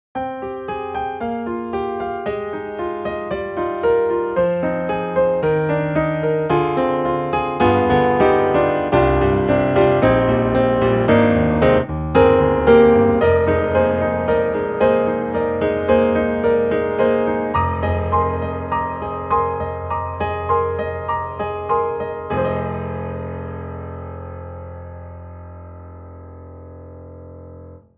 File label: Grand Piano; TOONTRACK; EZ KEYS